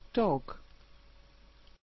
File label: dog